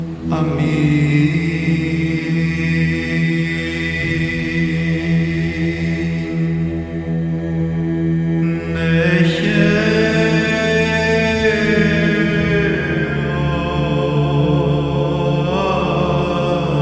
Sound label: Greek Orthodox